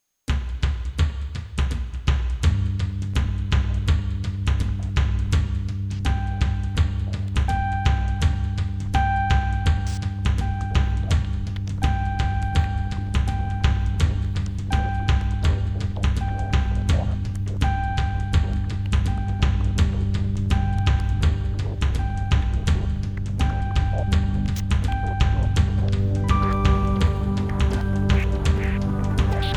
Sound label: Instrumental